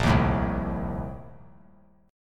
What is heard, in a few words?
Bb Major 7th Suspended 2nd Suspended 4th; BbM7sus2sus4 strummed; JGuitar